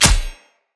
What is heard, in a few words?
Sword Impact Flesh; Weapon Impacts; Player; Swords; Short Sword; Combat; Local Player